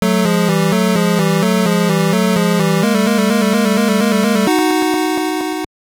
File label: Jingle, gb; Master Karateka